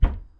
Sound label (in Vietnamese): Tieng mo dong cua tu quan ao